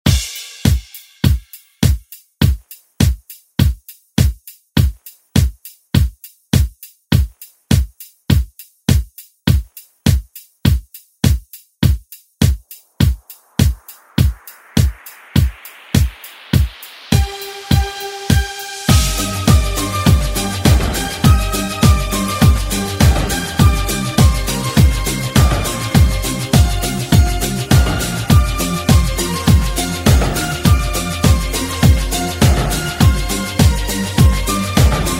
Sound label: 90s Re Drum